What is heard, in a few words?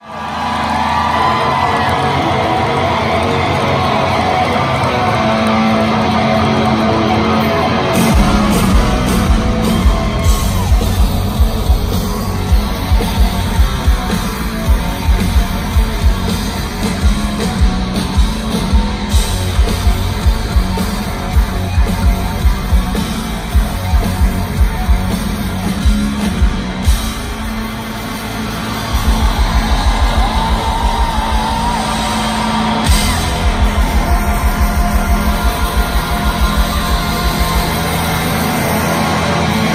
Riff